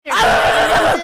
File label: bark rabid